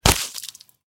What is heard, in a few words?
bullet impact flesh